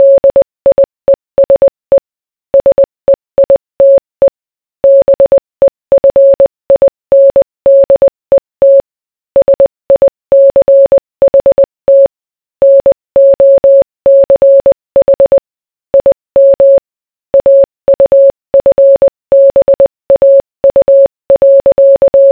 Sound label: morse